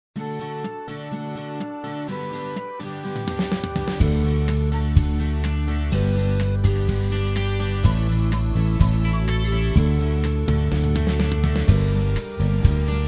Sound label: Alleluia basic backing; Backing tracks